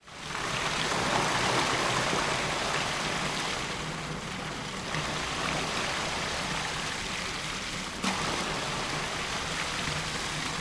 water ocean spur redoubt portsmouth uk waves through inlet moat